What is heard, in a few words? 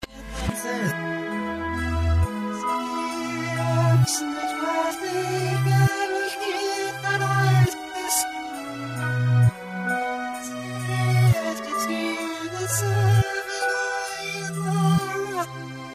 uni cymb; Funky cymbals played backwards